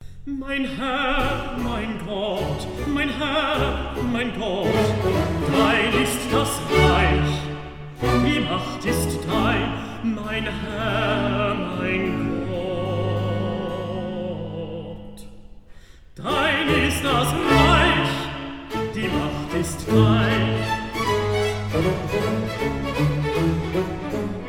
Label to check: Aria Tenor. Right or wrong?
Right